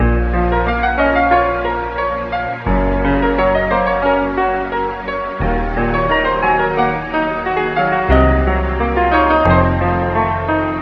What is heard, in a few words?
piano, Sad